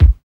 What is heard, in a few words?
DRM OLD, dance synth